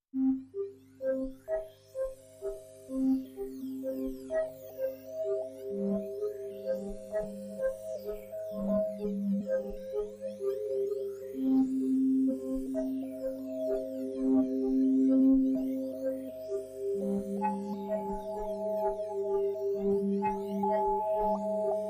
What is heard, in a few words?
Solfeggio Ambient